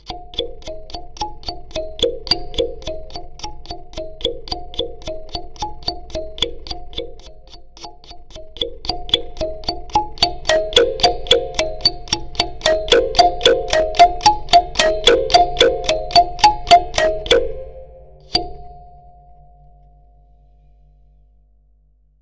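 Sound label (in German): Soundbox Mk2 Kalimba hoch gestimmt gegen Ende in Sättigung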